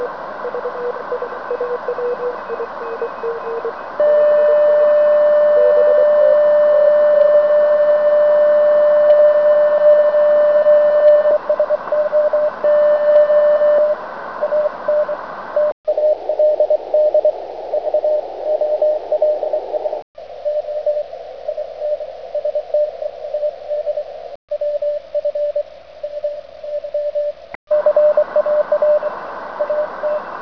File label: zerobeat